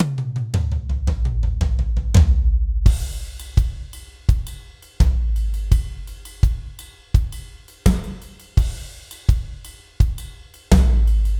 gospel drum only; gospel drum kit ii; polarity audio

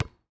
basketball bounce; hoops drums; drum kit; nova sound

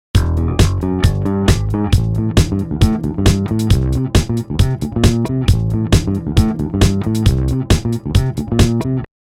Disco Drums Bass Group Bus

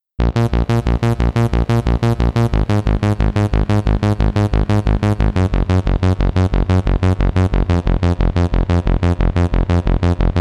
arp basic; the transmitter soundset

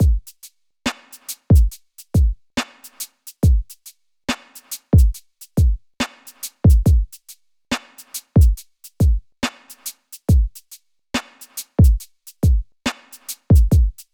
sidechain upward expansion; e expander600

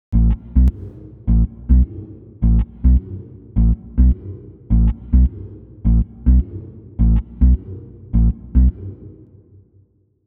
octave-up tail bass; anise glide reverb; audio spices

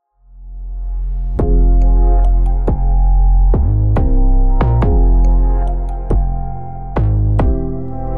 unwritten hip-hop; unwritten melodies; monosounds studio

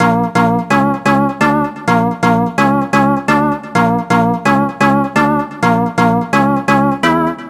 organ; incense; rav3 by the producer school

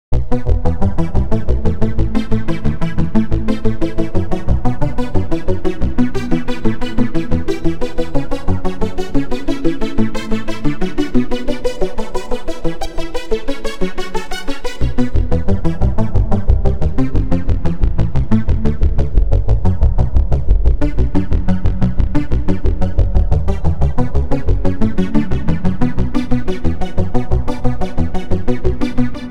rhodium arp; the space soundset

